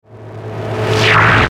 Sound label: Reverse Swell Static Fuzz Warble; Warped; Blastwave